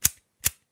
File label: Lighter; fl studio; drumkits; dstarkels plugnb; Fx